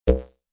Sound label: Multimedia, Blip, Blurp, Button, Menu, Rollover, Web Page Or Flash Animation Sound; Beep